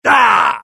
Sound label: death; mohaa; dialogue